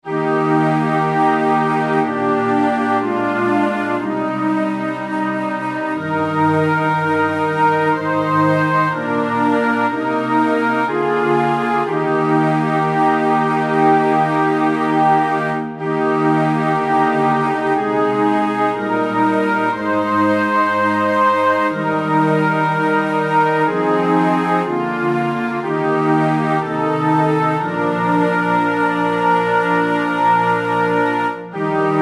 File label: Flute with Orchestra; Abide with me